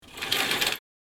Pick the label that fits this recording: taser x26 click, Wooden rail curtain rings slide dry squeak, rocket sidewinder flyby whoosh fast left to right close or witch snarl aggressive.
Wooden rail curtain rings slide dry squeak